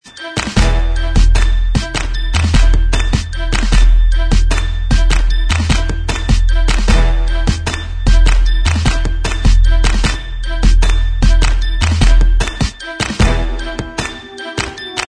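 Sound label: Instrumental Track, backing tracks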